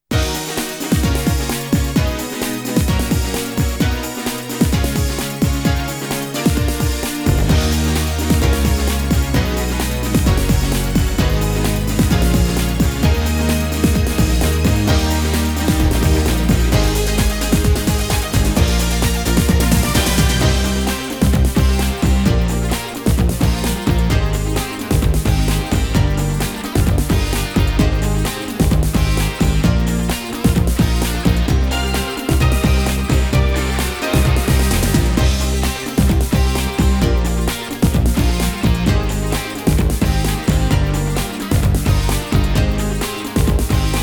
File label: Karaoke; Shoujo Kakumei Utena